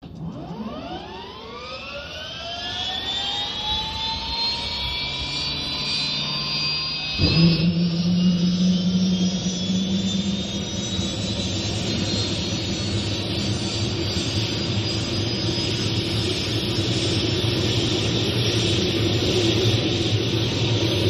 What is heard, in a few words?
OH6 Loach Exterior, little bird